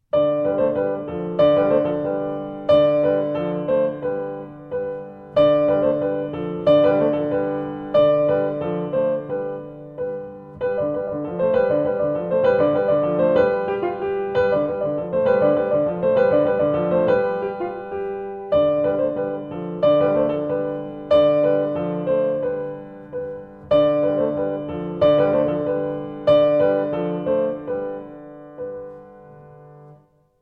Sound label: piano; Orff